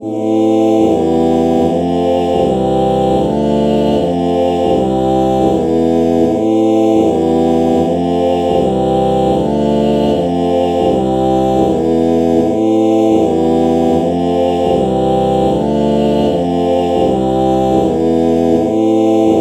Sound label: oh oh chord morph